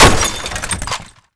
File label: suburban crash impact; Call of Duty Modern Warfare; physics